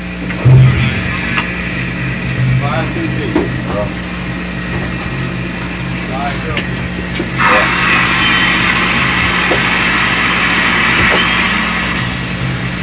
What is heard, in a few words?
surface surface surface - air into tanks, Sound of air filling ballast tanks; Caiman; OD giving orders for surfacing